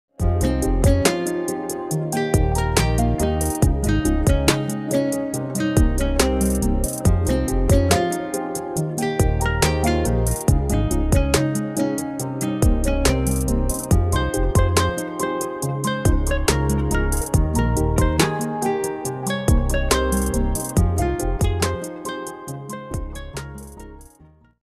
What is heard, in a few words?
pakistani drama instrumental ringtone romantic ringtone; Dobara